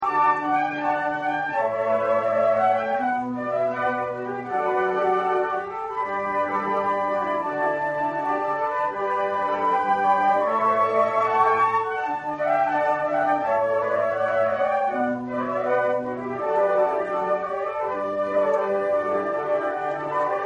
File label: concert, flute; Newyear concert 2015